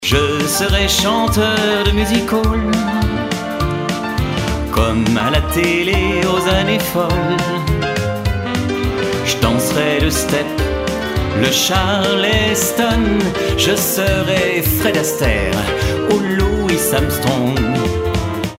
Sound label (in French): chanteur de music hall